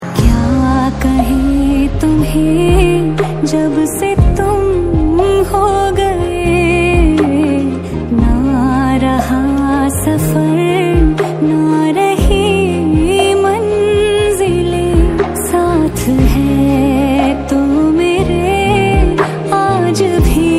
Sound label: female version